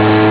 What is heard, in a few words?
laser; quake2; weapons